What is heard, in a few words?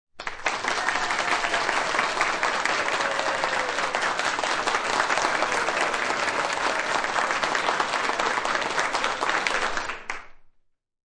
Crowd Applauding, Crowd applause. Small crowd, studio audience clapping; with very light cheering; Applause Sound Effects; LynneMusic